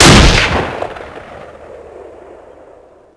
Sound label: enfield shoot; svencoop; ww2projekt